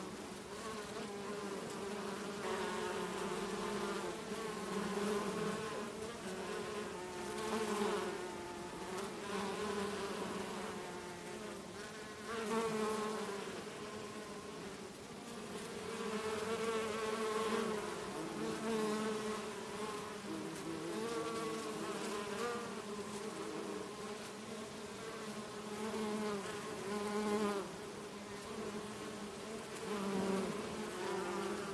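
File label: Environment Bees Loop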